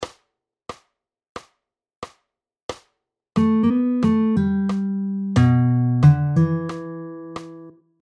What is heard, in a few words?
Pentatonic Riffs